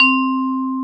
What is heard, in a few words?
C-3, VIBRAPHONE; AKAI S-Series CD-ROM Sound Library VOL-7